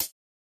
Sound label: noteblock hihat; mesecons